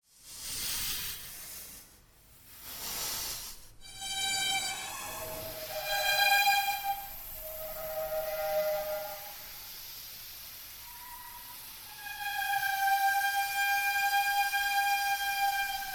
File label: scary demon crying screaming